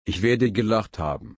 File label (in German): lachen